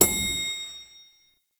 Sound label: Proto Infested Brachiolyst Hover Ring; Warframe; Abilities